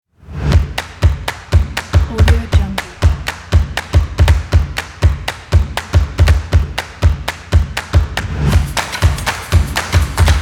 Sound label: stomp